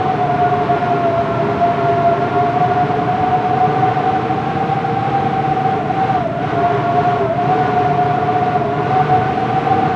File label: Amb Weather; Red Orchestra Ostfront 41-45